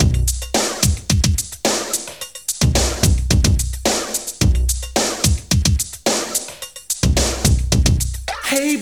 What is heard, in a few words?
109 bpm breakbeat b key